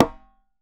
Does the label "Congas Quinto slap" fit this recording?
Yes